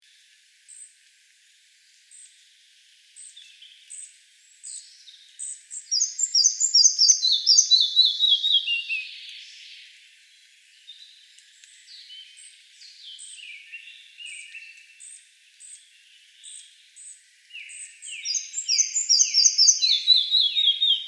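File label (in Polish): muchołówka mała śpiew samca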